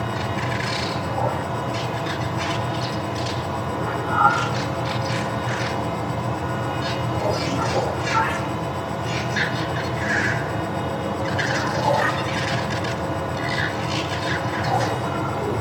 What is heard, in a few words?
research station signals; Stellaris